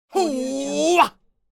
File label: martial arts fight grunt